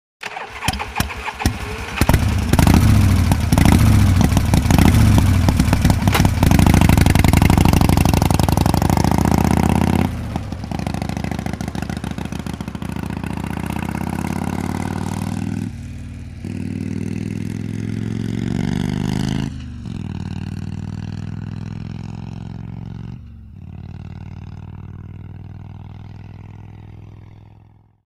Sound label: Start Away Harley Davidson